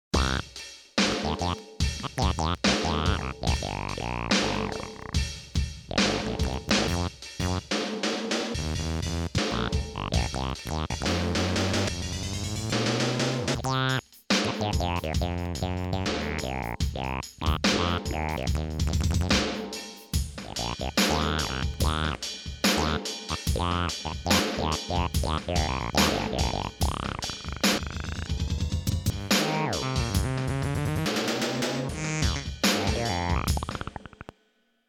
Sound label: Uber funk